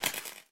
Spark; MiSide

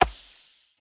Snake Hit Body; Game rip; MiSide

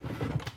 Chair Hammer Move; Game rip; MiSide

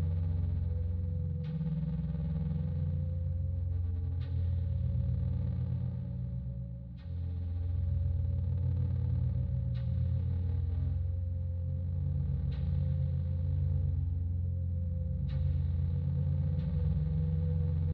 Ambient Sub; Game rip; MiSide

